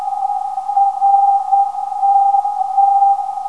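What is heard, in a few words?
303kHz, non-directional beacon; RTT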